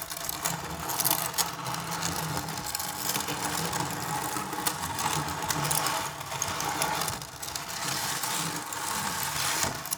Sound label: plastic slide loop